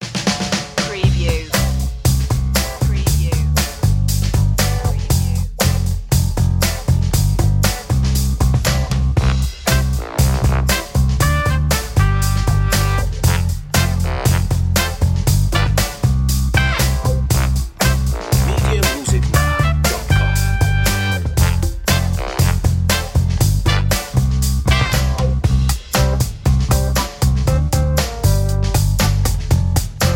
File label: fresh groove